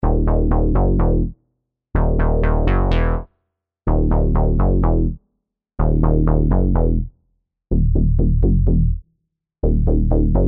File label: Moogy; SERUM ULT BS